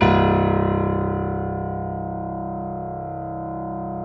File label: JAZZ C0, Keyboards Acoustic; AKAI; USB Soundscan vol.17